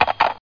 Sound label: Conect; Hame-Dori Hunter Syatta; telphon